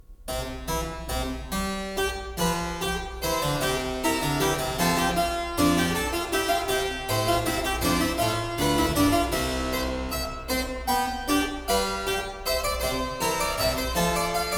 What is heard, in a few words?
Fugue; BACH; WELL-TEMPERED CLAVIER; WTC 2; Fugue 3; BWV 872